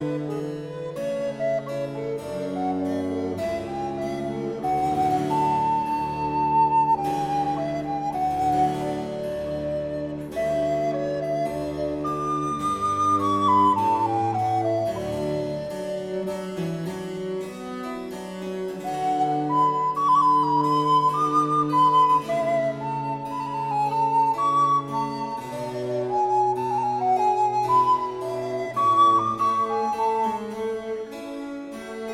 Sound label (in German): sonatina c moll fuer altblockfloete und basso continuo dolce